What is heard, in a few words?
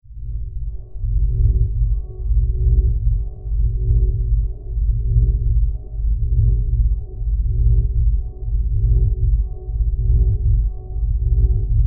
Drone Bed Pulsing Submarine Bass; Production Element Imaging; AdobeCC